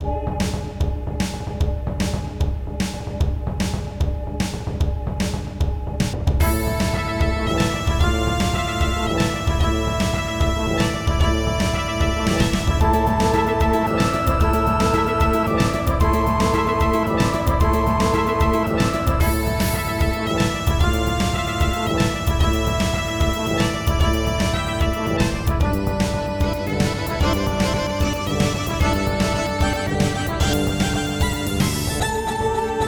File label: greek boss battle